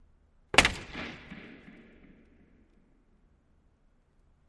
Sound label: Hockey Player Stick